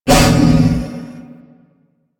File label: Wolf Bite; Animals; DE; Warframe; Quests; Duviri